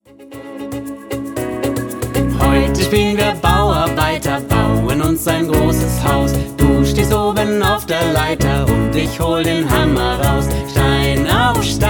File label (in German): Spiellied